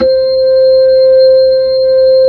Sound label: B-3, Hollow; Keyboards of The 60's and 70's